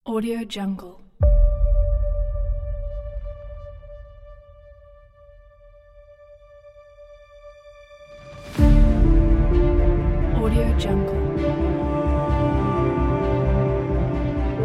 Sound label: the epic cinematic; martynoid